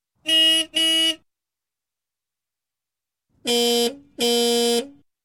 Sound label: Car Horn x 2; Sound Ideas; Series 2000